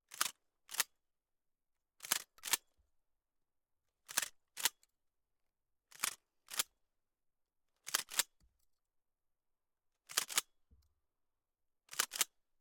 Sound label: SPAS-12 - Pump Action Fast - Close, Combat Shotgun; Pole Position; Franchi SPAS-12; VAR SFX; MKH418s